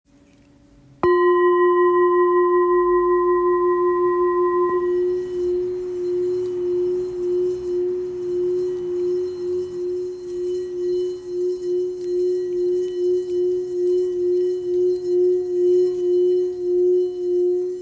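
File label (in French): Ancien Fa 655g, Bol Chantant Tibétain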